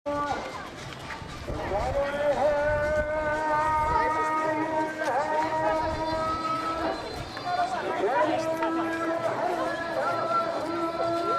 Muezzin Marrakech

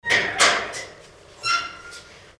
Porte Imzzoudar

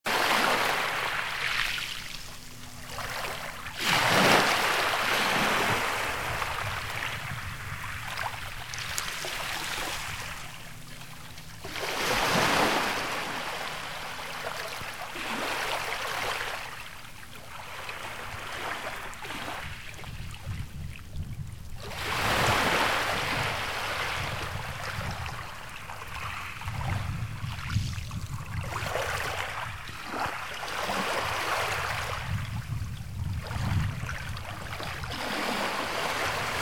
Vagues sur Galets

